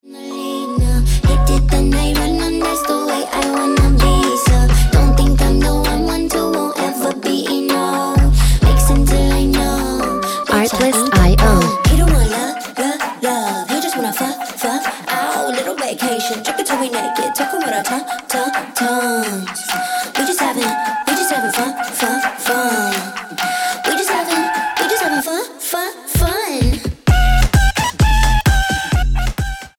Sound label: Ringtone